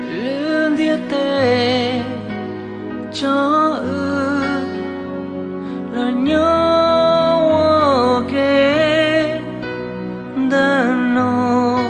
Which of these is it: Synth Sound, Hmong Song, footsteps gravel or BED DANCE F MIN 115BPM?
Hmong Song